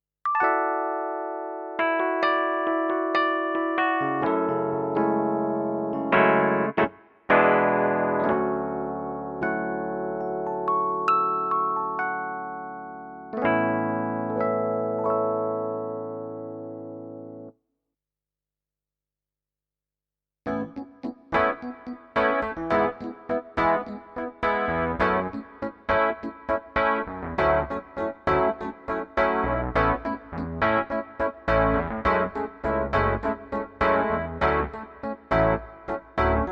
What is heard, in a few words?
E-Piano; MOX6